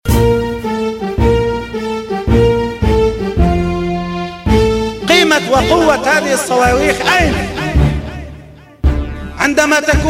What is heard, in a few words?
rocket